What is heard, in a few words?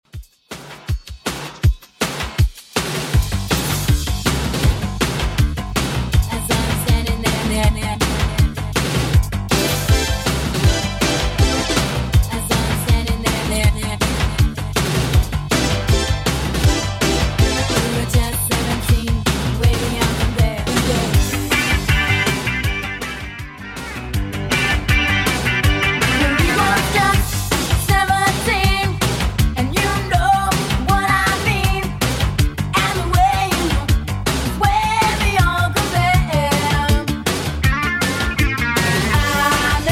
80s Redrum 160bpm